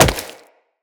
Wall Jump Rock; Tenno; Footsteps; Wall Run

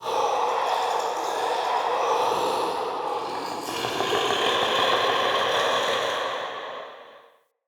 Male Vocal Idle, Enemy; Mockery; Mockeries

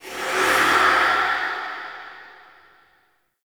Evolving Weapons UI Open; Warframe; Zariman

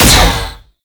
Shot, Weapons; BE Tetra

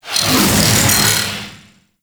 Knight Wave Ability Proj By; Warframe; Duviri; Dax Knight; Abilities

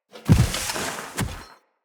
Corrupted Battle Dog Charge Attack Foley

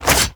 Melee Block Start; Warframe; Wraith

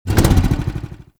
Engine Rev Stop, Motorcycle; Warframe; Vehicles; Motorcycle Ninety Nine

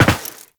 Land Metal, Footsteps; Warframe; Tenno

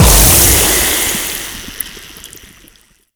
Infested Ancient Toxic Ground Blast

